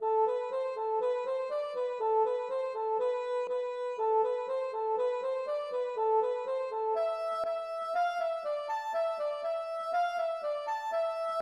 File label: polka